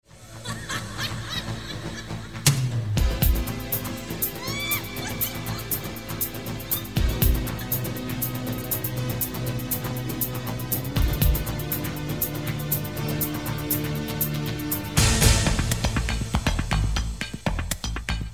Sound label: techno; blender